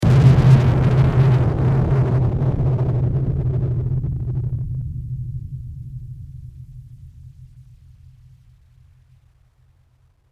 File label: bomb